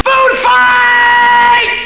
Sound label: food figh